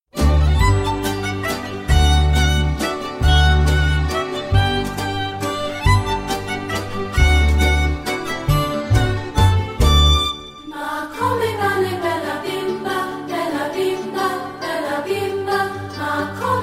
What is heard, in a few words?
bella bimba vocal